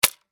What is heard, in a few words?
Nerf Rifle Shoot